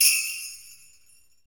PRC SLGH B0X

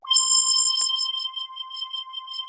LFO LEAD C5 L; USB Soundscan vol.49 - Analog Fantasies; AKAI

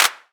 perc classic clap; percussion

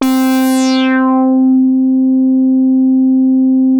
C5, Bass; E-MU Producer Series Vol. 6 – Analogia Project (CD 1); Boom da Bass 2

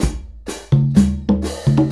Conga and Hi Hat; Combo

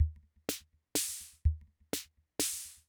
Loop, rhythm king; classic machines

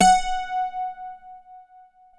PLK F#4 Bouzouki, Greek; NorthStar - Global Instruments VOL-1

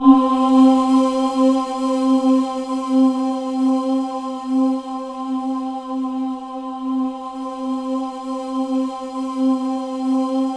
C3, Synth Leads

